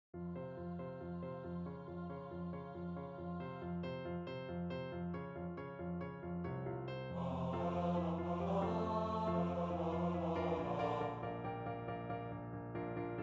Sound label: tenor bass